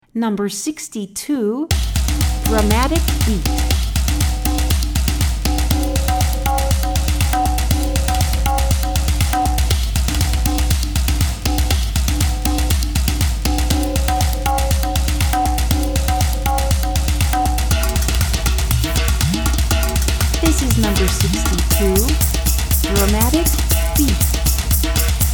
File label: Dramatic Beat